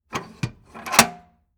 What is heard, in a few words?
toolbox handle move; prosoundfx